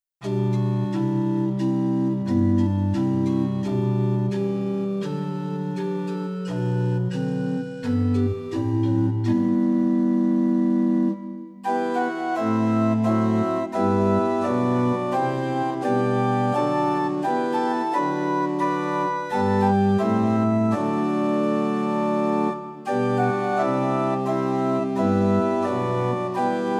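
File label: Pitch 440 Temperament Meantone, organ; hymn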